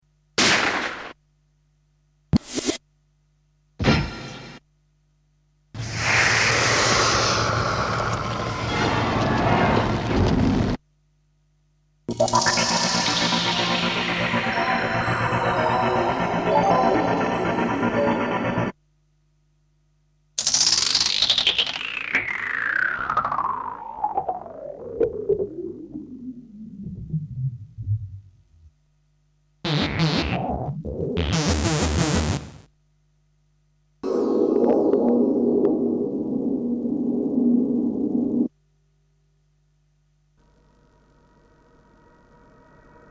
Sound Effects; Kniteforce; 1000 Samples Volume 1

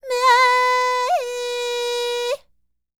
TURKISH, Vocal World Voices NEAR EAST; ILIO; Vocal Planet VOL-5

